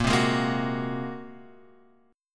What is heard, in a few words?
A sharp 7th Suspended 2nd Sharp 5th, guitar chord; down